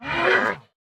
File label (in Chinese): 熊猫无法繁殖声